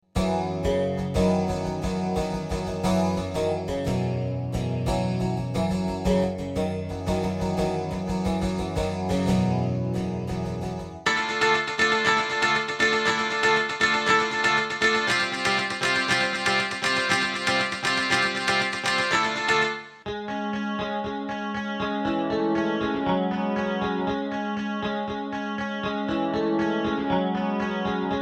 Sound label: Clean Guitar